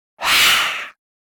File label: Air Scream - Short Pass By Zombie; Hollywood Edge; sound designer's toolkit v2